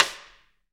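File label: SD, snare drum, Real-Kit; 90 s Sample CDs; Sound & Vision - Gigapack I CD 1 (Roland)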